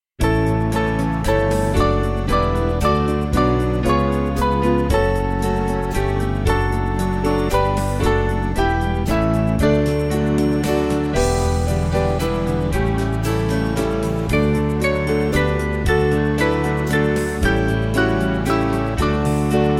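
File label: Music Backing Files for Churches; Born Among Cattle; Tishagion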